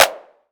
clap c key, Royality free clap sound clip tuned to the C note. Loudest frequency: 3395Hz; percussion; single hits one shots